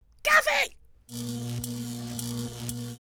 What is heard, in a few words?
kaffee w vibrate